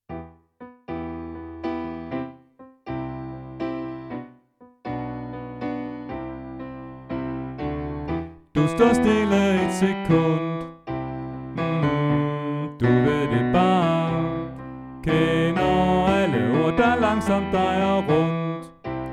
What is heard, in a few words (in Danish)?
Bas; oevefiler